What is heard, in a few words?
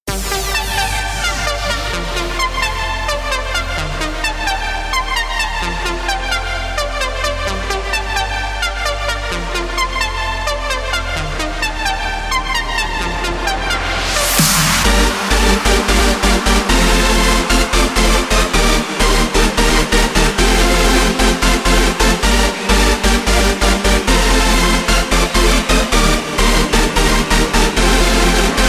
FUTURE ELECTRO FESTIVAL